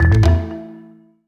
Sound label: Perc Star